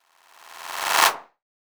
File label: CLAP FX